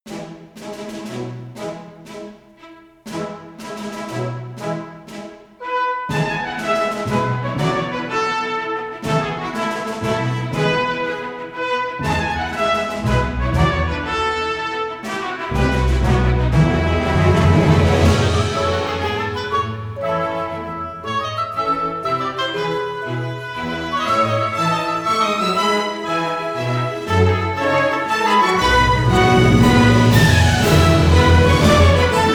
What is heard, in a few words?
Orchestral Version; Angry Birds Fight! Main Theme